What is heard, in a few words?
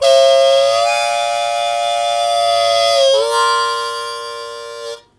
Blues Chord Attk Bb1, Harmonica; 90 s Sample CDs; Zero G